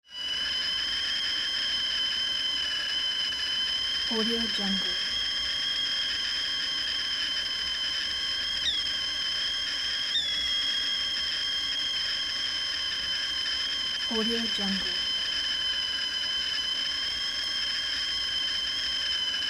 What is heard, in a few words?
kettle whistle constant